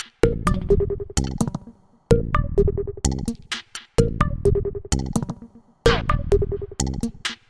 126 Min loop Dm

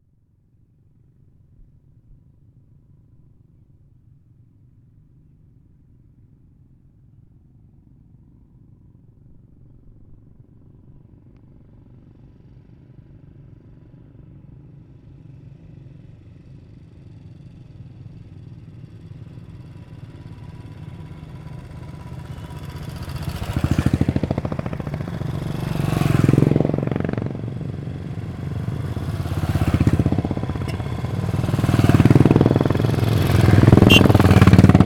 Enfields; Warila

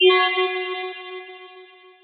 Magic Click

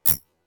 Horror Transition Sound; Vtkproductions

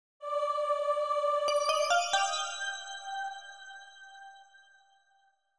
Paris Orly

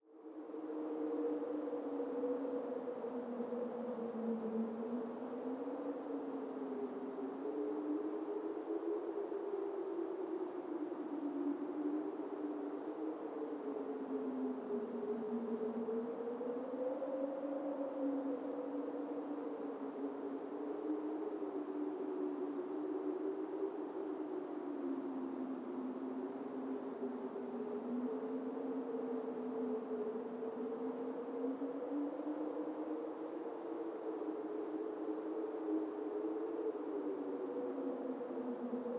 Wind Synth Very Fierce Winter Muffled 2osc

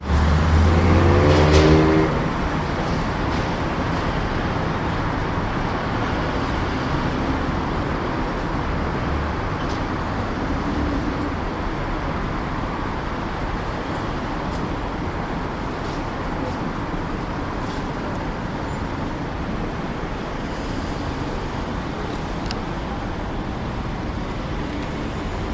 Paris Porte Maillot Traffic

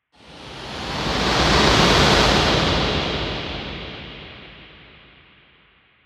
Woosh

